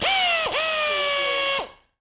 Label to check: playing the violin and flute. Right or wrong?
Wrong